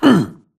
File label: grunt hits; Titanfall 2